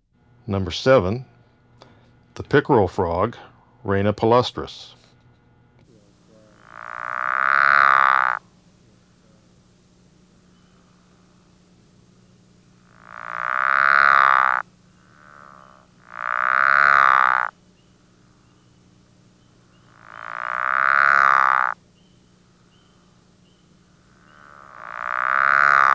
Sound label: pickerel frog call; texas nature trackers; amphibian watch